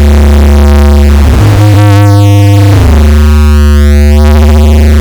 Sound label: fartii; OSWorkshop